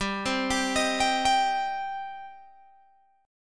G Suspended 4th Sharp 5th G, down, Guitar, Open G

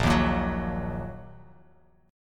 Bb Suspended 4th Sharp 5th Bb down Bass Standard; strummed

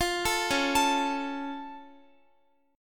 Db Augmented Db down Guitar; arpeggiated

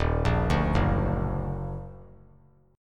D sharp Minor Major 7th; arpeggiated

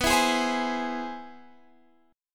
C Augmented Major 7th B

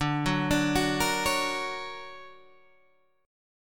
D Augmented Major 7th down Guitar Standard; D+M7 arpeggiated; JGuitar

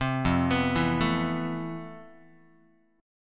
F Major Sharp 11th, Guitar; arpeggiated

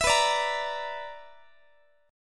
B-Minor Major 7th Double Flat 5th, Guitar; BmM7bb5; strummed; x,x,19,21,18,21

